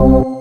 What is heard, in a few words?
HIT, STABS, House